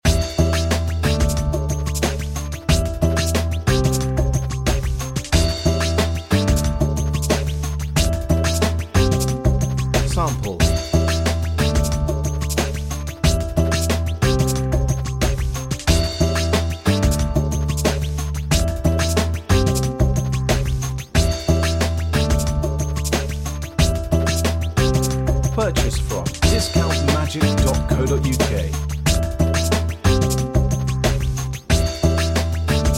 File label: squeak bass remix